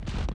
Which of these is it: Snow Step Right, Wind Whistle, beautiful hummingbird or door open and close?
Snow Step Right